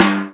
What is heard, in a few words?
tom, dance drums; Best Service; Dance Mega Drums; TOMS